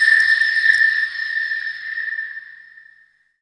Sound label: atmo, FX; Ueberschall; Techno Trance Essentials